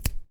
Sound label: WOOD, SMASHING; AKAI S6000 CD-ROM - Volume 6